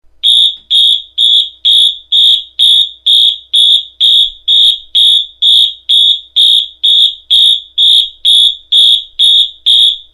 wheelock mt4 115 wh march time horn

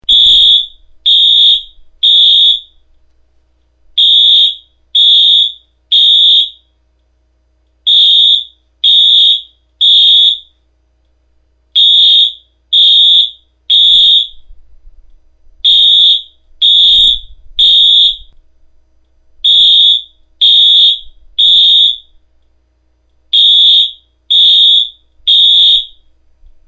wheelock mt4 115 wh code 3 horn, alarm

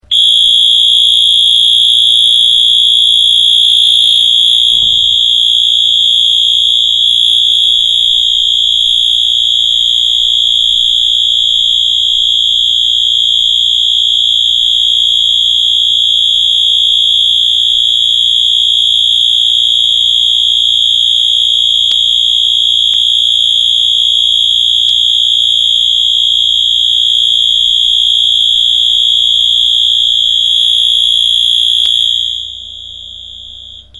wheelock mt 24 lsm horn, alarm

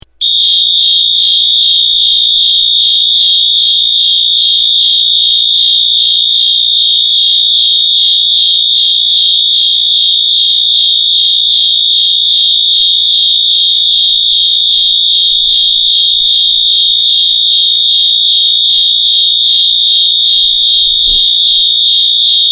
wheelock mt4 115 wh horn

